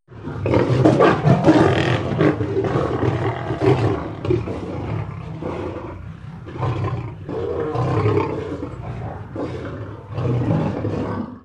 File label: Lion Growl Group Lion; big cats